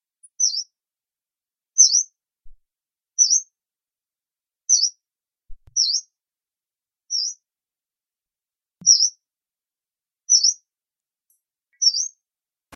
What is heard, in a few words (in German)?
gelbbrauen-laubsaenger